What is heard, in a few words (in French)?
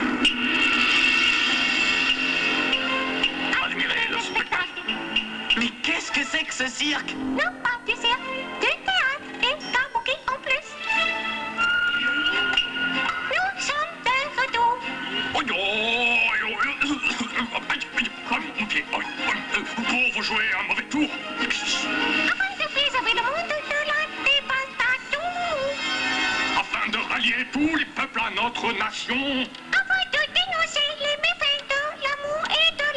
Motto Japonais Traditionnel; Pokémon; épisode 31 "Le Ninja Pokémon"